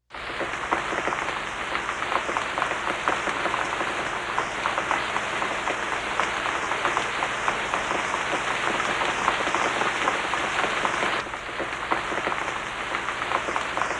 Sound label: Rain In Woods